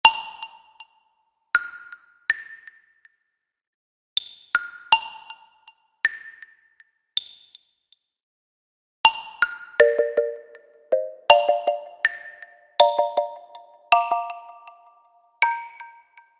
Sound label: mystical ringtone; android